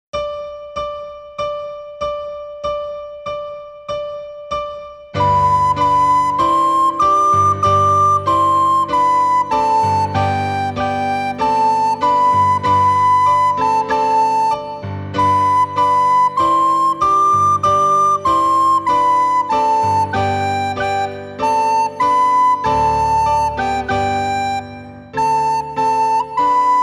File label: ode to joy full performance